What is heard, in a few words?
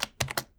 Keyboard - Key Combo; Keyboard Sounds